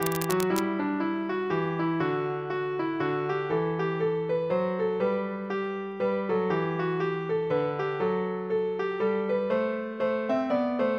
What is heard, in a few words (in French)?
Mazurka; de Loulette